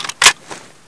ak47 clip out, weapons; cstrike; DEX

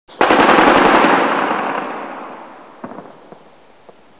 amb; cstrike; DEX

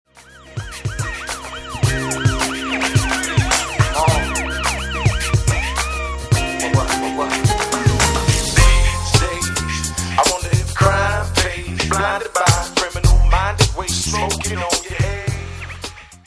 endround; cstrike; DEX

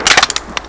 awp boltback, weapons

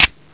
dry fire pistol; cstrike; DEX

